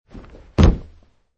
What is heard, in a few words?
Landing